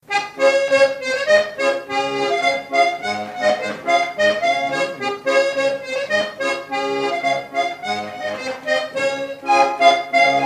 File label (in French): accordeon